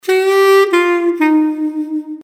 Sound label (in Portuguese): logotipo sonoro; viaquatro